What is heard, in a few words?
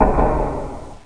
CLUNK; Gunlok; Robots